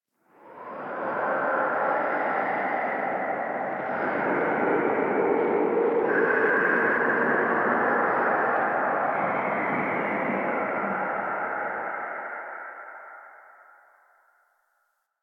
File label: Whispers Dark; Warframe; Ambience; Entrati